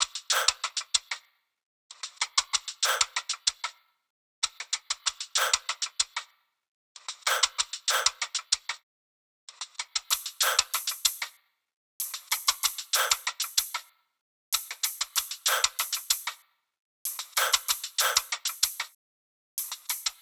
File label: loop perc 95